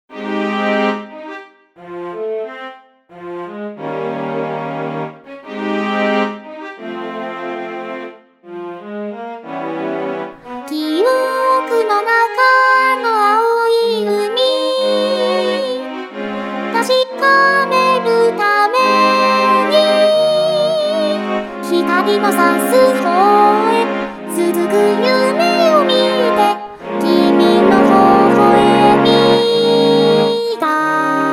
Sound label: SESSION STRINGS